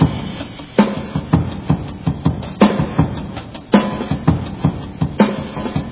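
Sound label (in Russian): drum loop live concert rock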